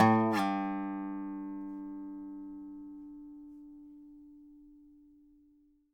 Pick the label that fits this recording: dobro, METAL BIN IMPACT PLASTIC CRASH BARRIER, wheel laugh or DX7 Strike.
dobro